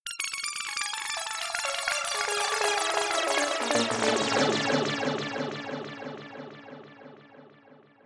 arpeggios arpeggio

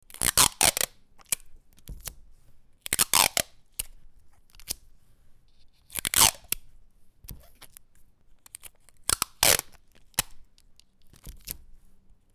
office tape

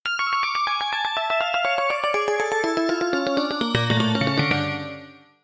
synthpiano fm synth

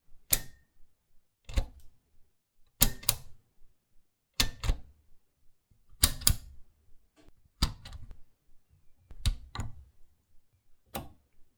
turn knob

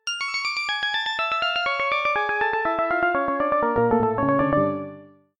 synthpiano analog piano

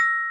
Mallet Mono

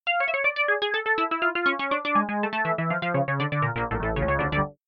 arpeggios sequence

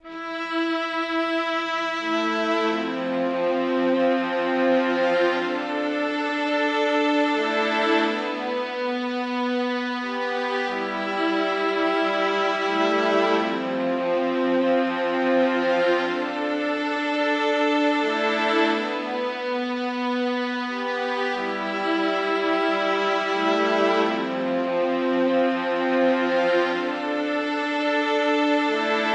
concerto; minvasion

